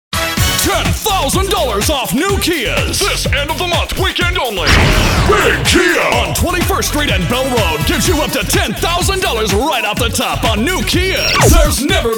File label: High Energy montage